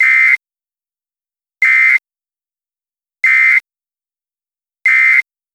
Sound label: Urgent Alert